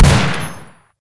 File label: marine shotgun; cdogs; doom